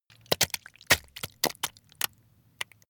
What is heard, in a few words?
intestines slip